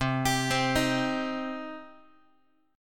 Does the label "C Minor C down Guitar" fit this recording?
Yes